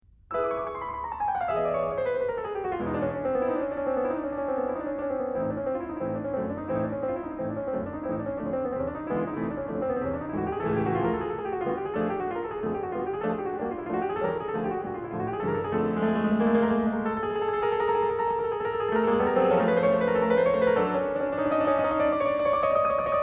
Il volo del calabrone, pianoforte; Rachmaninov

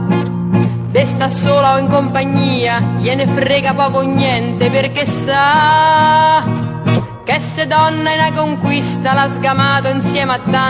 tango rivisitato

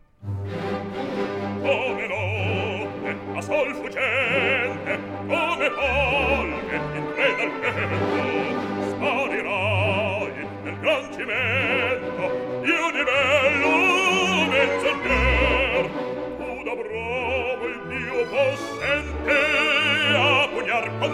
Coro

